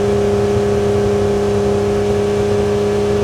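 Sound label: Truck Cherokee Loop; SFX Kit; Trucks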